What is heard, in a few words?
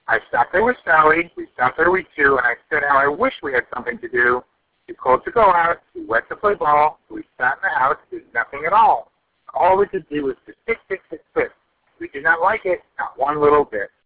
background noise